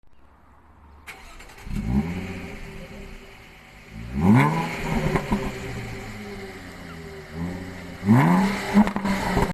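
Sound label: Jaguar, exhaust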